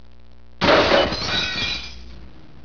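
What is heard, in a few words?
GLASS BREAK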